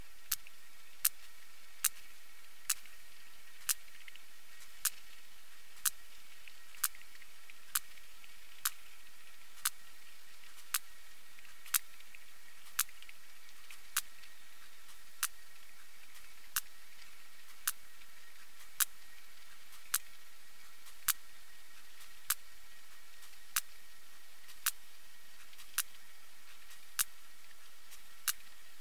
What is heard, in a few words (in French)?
clic cachalot